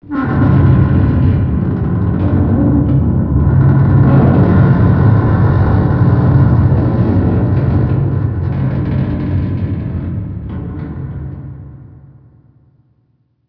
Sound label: ship creak medium; trek